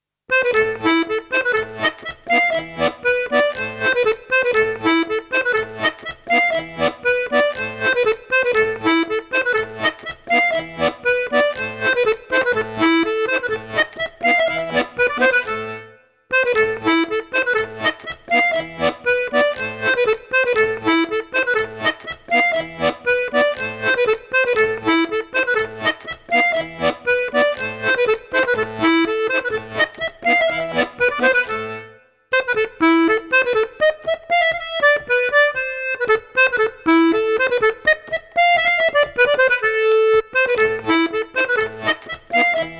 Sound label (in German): akkordeon